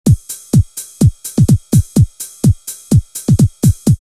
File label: 909 BD HiHat 126, drumloops, DrumMachines; X-Static Goldmine